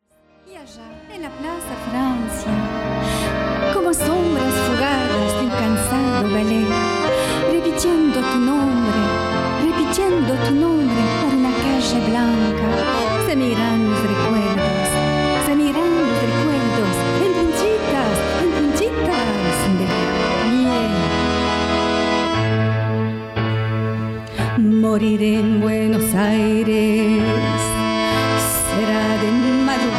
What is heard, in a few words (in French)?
tango récital spectacle milonga chant